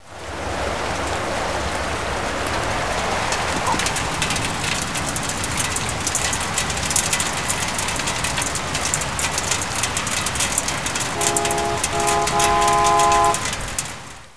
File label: rain snd